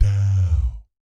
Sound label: DOW G2, Jazz FX; Spectrasonics; Vocal Planet; JAZZ COMBO